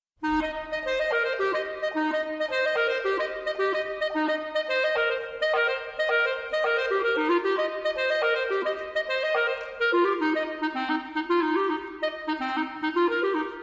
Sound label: chinoiserie